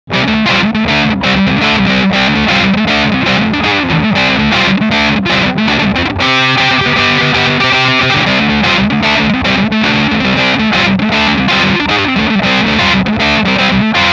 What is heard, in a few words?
Drive